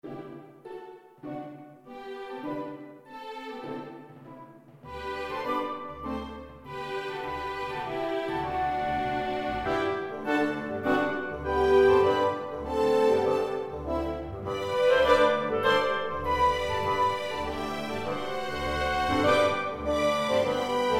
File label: chamber